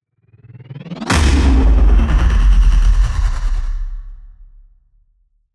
Cinematic Hit; soundbits

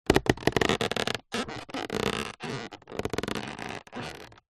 plastic creak squeak; foley

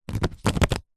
leisure board game game cards stack; telstrations

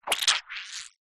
cartoon short wet squelch

